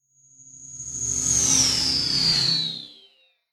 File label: Whoosh SciFi Space Vehicle Dual Motor; Whoosh Space Vehicle Small Dual Motor Spacecraft Scifi Science Fiction